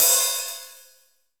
HH OP; EdgeSounds; Drum Mashines; R-8 DRUMS

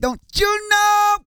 REGGAE, Vocal Planet World Voices

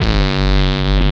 HD BD, Hard & Loud Techno

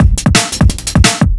breakcore breaks

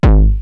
Roland TR-909 BD; BD Selection

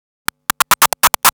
Scratch Fills 140BPM

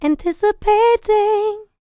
LICKS, Vocal Planet R&B & Dance; ILIO

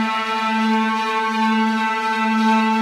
Cigar Fuzz FX 170 A, guitar drone

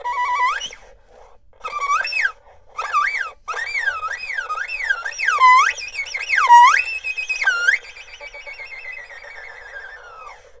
ERHU BIRD; INIS - Opium; ERHU MAGIC

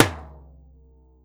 TAMBORIM, Brazil Drum Perc; E-MU Producer Series Vol. 7 – Old World Instruments

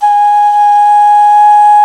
D OCRNA, CLAYFLUTE; Africa; Spectrasonics; Heart of Africa 1

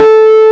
A2 N-HARM, GUITAR; AKAI S-Series CD-ROM Sound Library VOL-8

